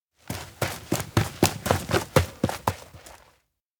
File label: steps outdoor ground run; anemoi